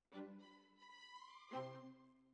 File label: Violas, jazz for piano